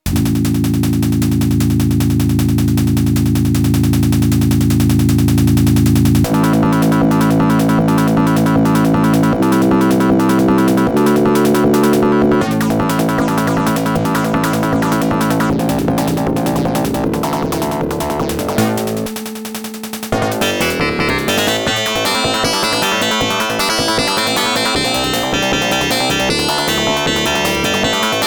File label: opti 82c925, fm; Примеры звучания FM-синтеза разных звуковых карт под MS-DOS